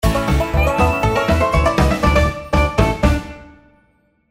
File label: big Win; Dynamite Digger Eureka